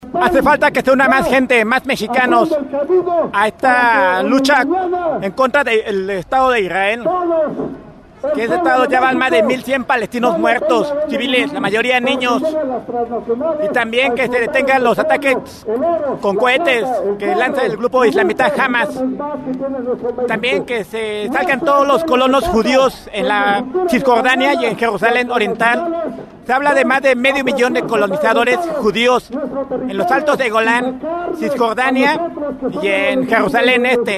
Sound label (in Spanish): marcha; palestina julio 2014